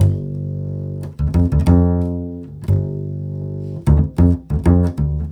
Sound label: 090 NuJazz Bass G maj; Pro Tools Essential Loops; Digidesign